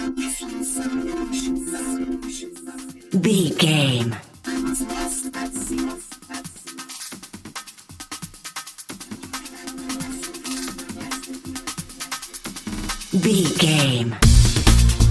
dreamy whisper

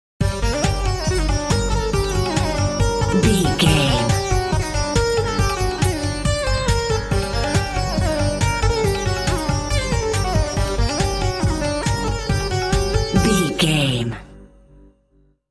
egyptian video game level bumper

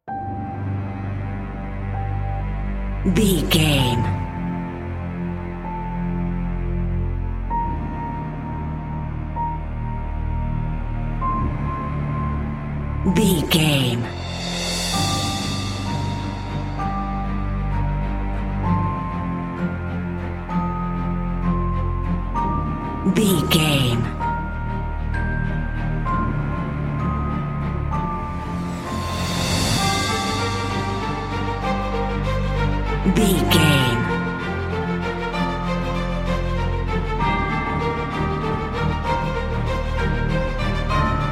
epic cinematic no drums

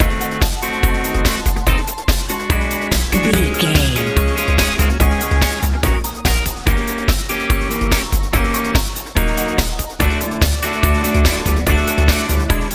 hot blooming techno

